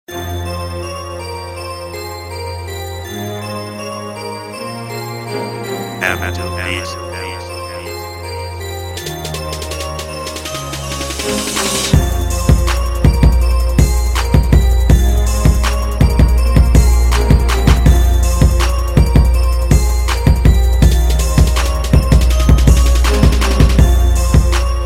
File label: gangsta beat; hip hop